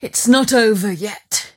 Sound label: cast pain; dota; mirana